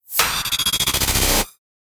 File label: bloody nightmare hard stutter impacts; soundmorph